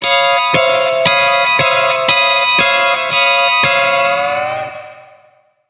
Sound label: Building Hover